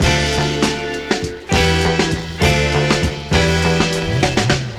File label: full, Loops Phonky